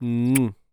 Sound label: Human Kiss Short Smooch Kiss